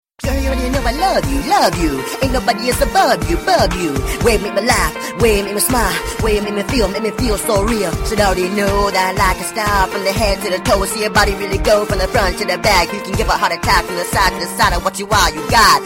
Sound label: love beat song ringtone